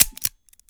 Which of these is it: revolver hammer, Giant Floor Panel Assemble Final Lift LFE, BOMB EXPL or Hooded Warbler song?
revolver hammer